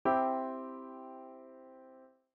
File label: Minor Chord Progressions Example 1 C MAJOR